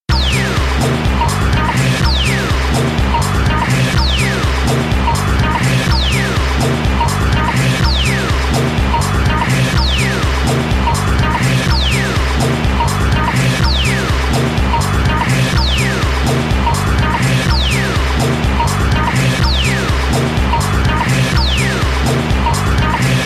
Disco; Meteor

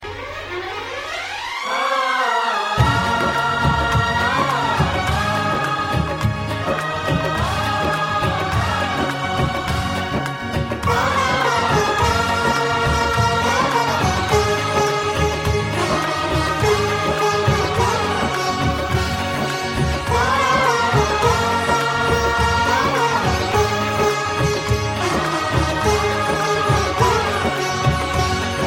Sad Music

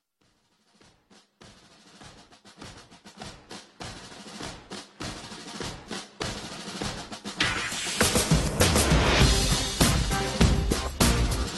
New Army Tone

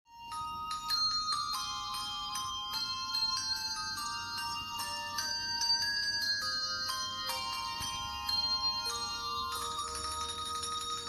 bells jingle

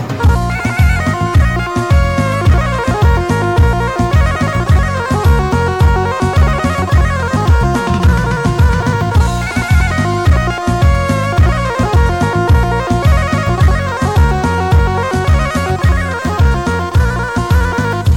Balkan Turbo Folk

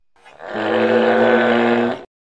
Nothronychus sound

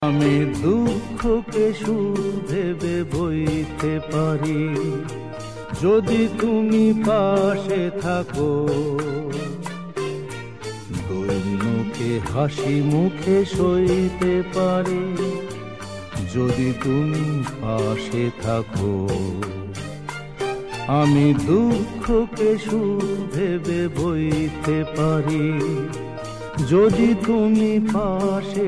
Sweet Bengali Song

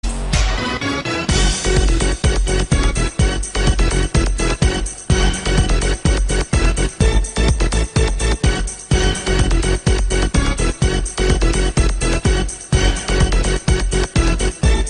disco

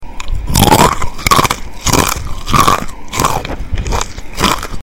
Crunchy

